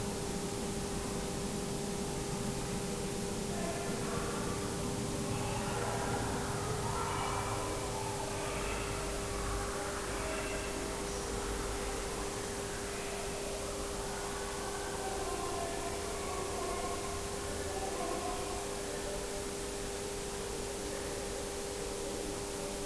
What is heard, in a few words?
kids playing; School Birkenhead